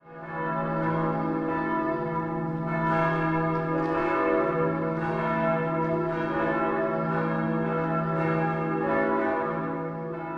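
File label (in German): glocken in dreifachrigem Holzstuhl seitlich angehängt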